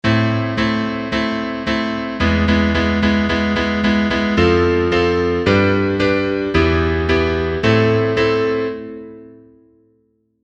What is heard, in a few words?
non diatonic